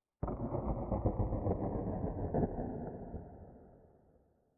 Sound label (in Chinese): 下界荒地点缀声; ambient